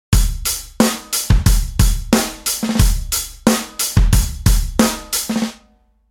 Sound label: ghost